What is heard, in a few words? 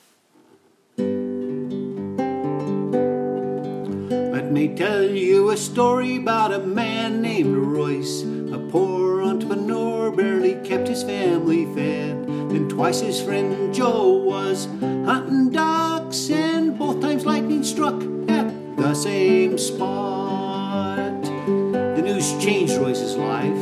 Ballad